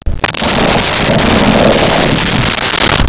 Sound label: Bear Growl; Animals; SONY PICTURES SOUND EFFECTS SERIES